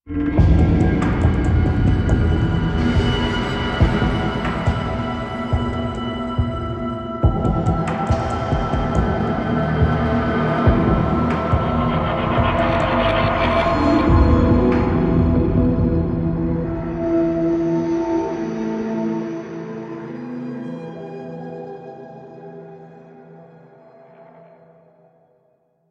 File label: Hub Intro Cue, Music; Warframe; DE; Hubs; Zariman